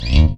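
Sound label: Chapman Stick, bass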